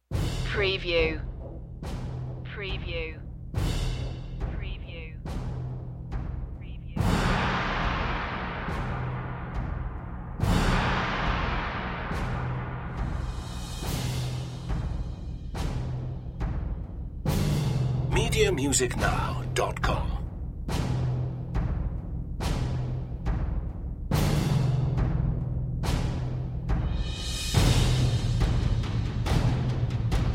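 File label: percussion mix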